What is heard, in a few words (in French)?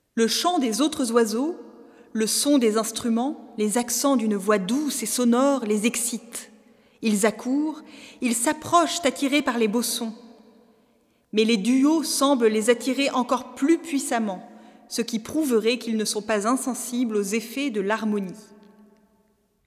Le chant des autres oiseaux; Buffon